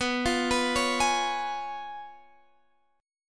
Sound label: Db 7th Cb; Db7/Cb arpeggiated